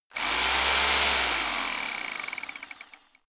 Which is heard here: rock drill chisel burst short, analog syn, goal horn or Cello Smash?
rock drill chisel burst short